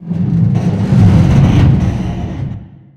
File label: tank fire metal stress